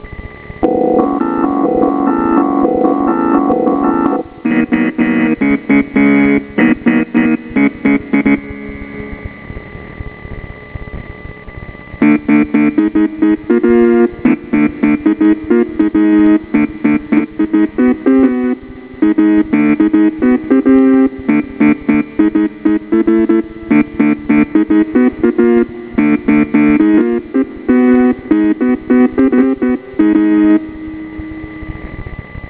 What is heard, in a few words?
techno